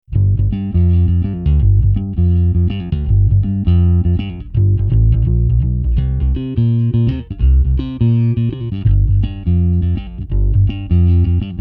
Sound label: svt; zoom b3